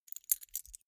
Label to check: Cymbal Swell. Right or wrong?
Wrong